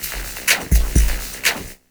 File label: 125 Dance Perc; Pro Tools Essential Loops; Dance DJ; Percussion